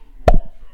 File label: spawn; Wackelpeter